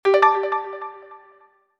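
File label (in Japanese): kirakira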